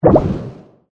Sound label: Cauldron explosion